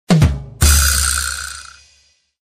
funny sms ringtone

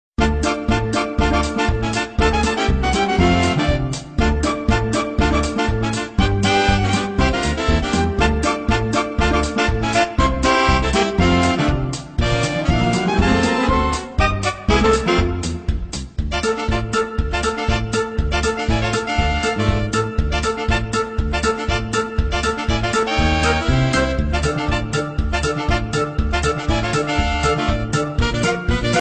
ringtone

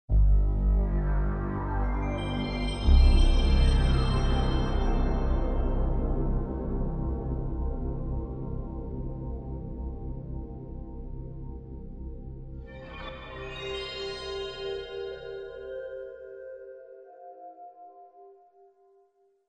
ps1 startup ringtone

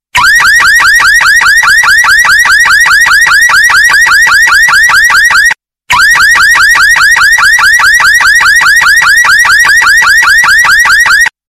car alarm ringtone

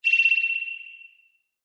sonar ringtone

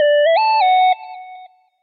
lg echo notification sound ringtone

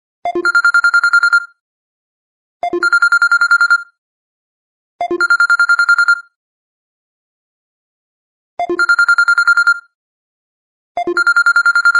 samsung basic bell ringtone

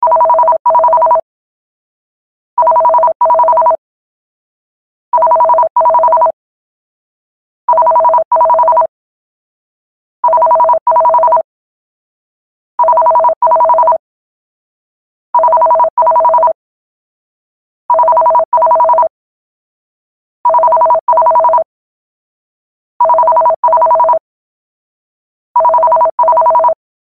landline phone ring ringtone

